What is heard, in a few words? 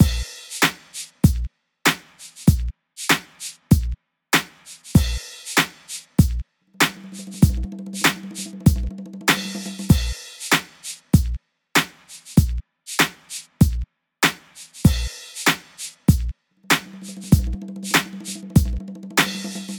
Hip Hop Drum Loop